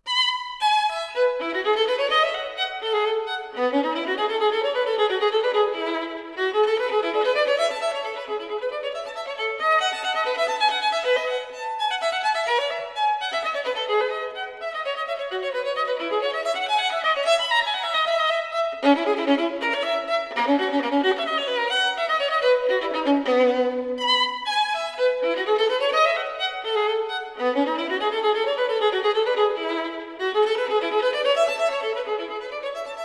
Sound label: violin solo; bach